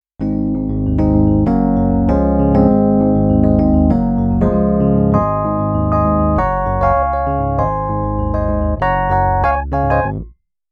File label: Nord EP5 Bright Tines